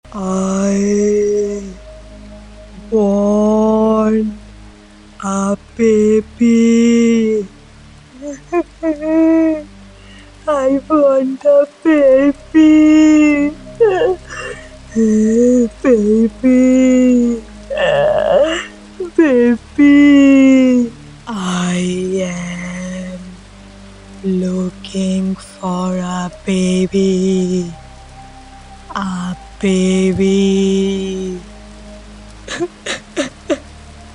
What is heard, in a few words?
creepy girl singing i want a baby scary horror voice